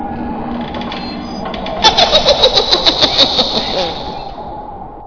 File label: Haunted House Sound